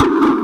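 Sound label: hard bass drums; Bazzdrumz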